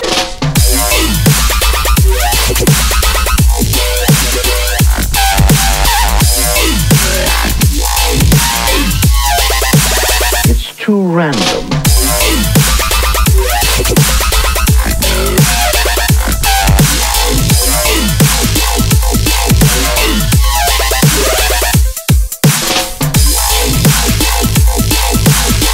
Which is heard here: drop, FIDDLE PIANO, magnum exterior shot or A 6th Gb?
drop